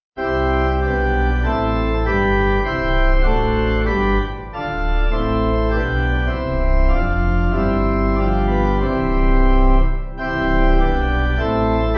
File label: God The Father Gott Der Vater Pipe, Pipe Organ